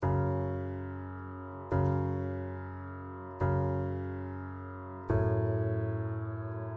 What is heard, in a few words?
142 BPM F# Min Piano; Loop Stems; Cymatics; Cymatics Gems Vol 7; Projectile